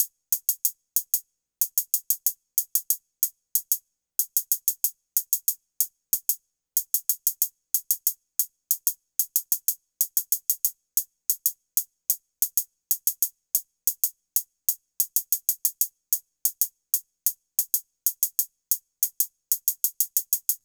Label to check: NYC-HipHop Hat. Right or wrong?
Right